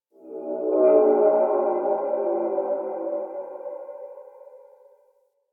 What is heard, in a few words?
Exterior Space Whistles, Ambience; Warframe; Rail Jack